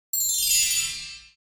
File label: Cartoon crotales gliss